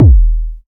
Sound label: subtle reverb steel kick drum sound D# key